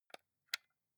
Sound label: console controller xbox onoff press slow soft